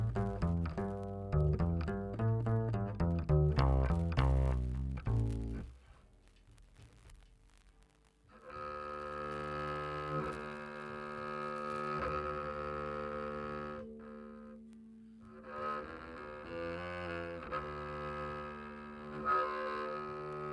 Bass DI

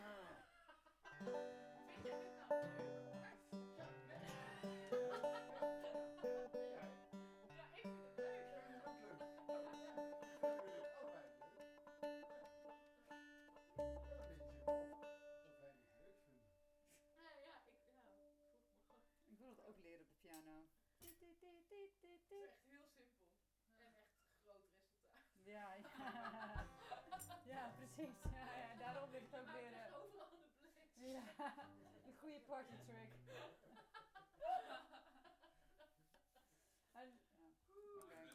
Banjo

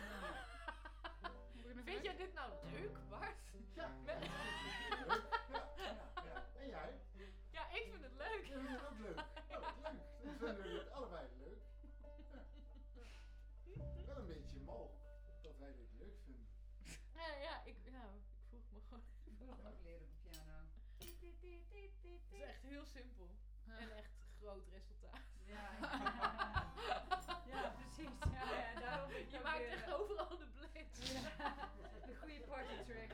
Voc Omni

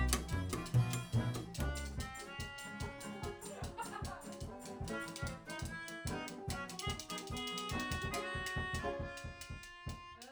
Bass Mic

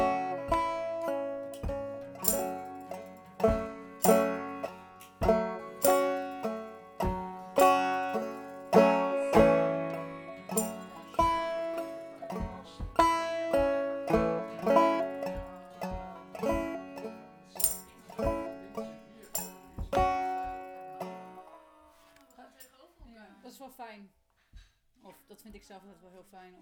Banjo

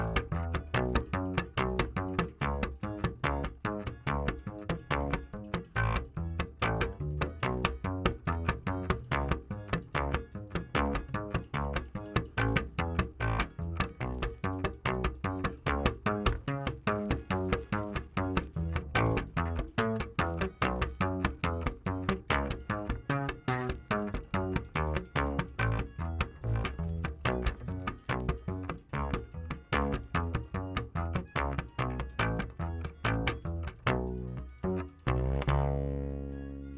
Bass DI

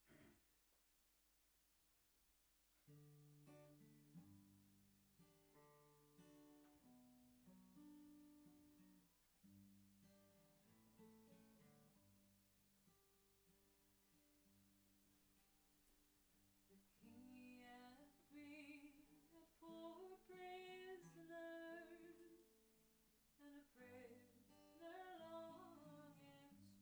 Bass Mic

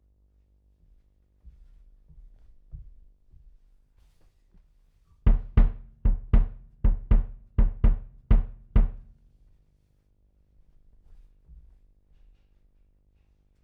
Chordz

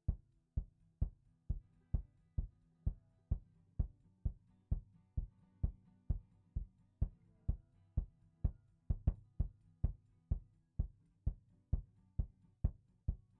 Kick

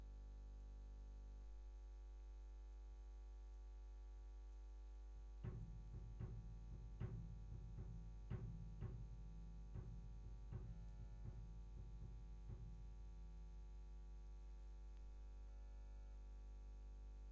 Bass DI

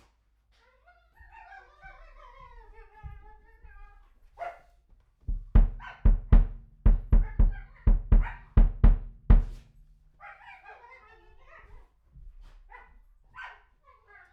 Chordz

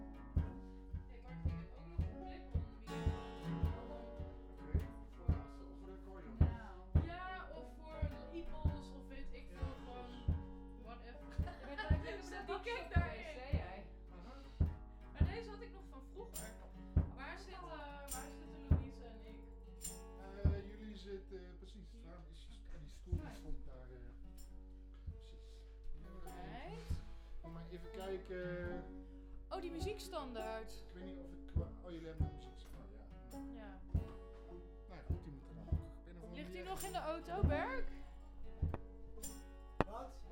Voc Omni

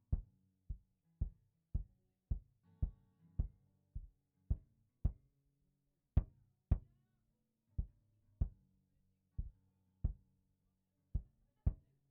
Kick

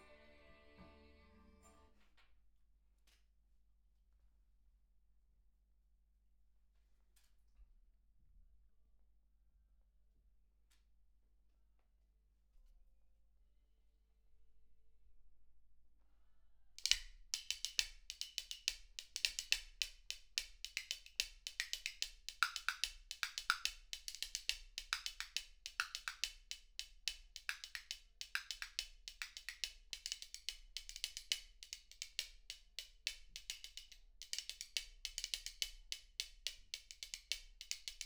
Spoon

